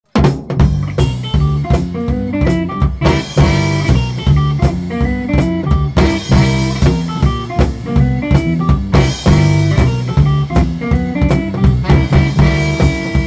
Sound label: Blues quartet; fin soit disant définitive